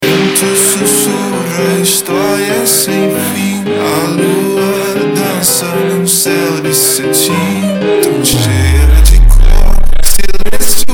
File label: Brazilian Fonk